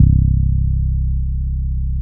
BASS GTR; East West - Phat & Phunky

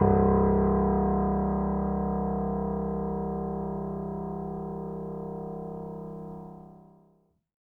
piano mono; InVision Interactive; Miroslav Vitous

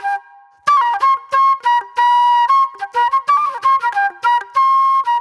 Soul-Jazz Flute, Call And Response; Sonic Foundry (Sony Creative Software); Manhattan Flutes

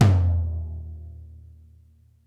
Interactive Drum Kits L; Sonic Reality; 90s sample cds

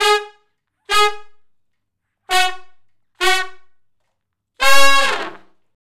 R&B Riff V. IV, I (Db) unison, Horncraft for R&B; Crimson Blue and Fabulous Horncraft 4 RnB; Sections

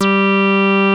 HITEC HI G3, ANALOG BASS; Music Store - Claudius Diemeter Schwerlos

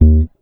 HHH Eb1, HEY HEY BASS; Sampleheads; Ultra Freakin' Bass

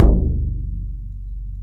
Bodhran Lo; Multisamples; Digital Sound Factory Planet Earth

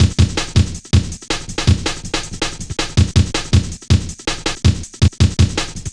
boom, Drum Loops; Zero-G Jungle Warfare Complete

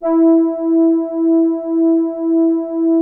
soft brass E4; SOFTTRIX; Music Store - Claudius Diemeter Schwerlos

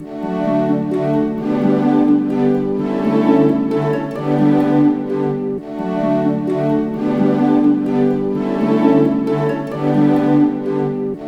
HAPPY, ORC Happy Hour; East West - Scoring Tools

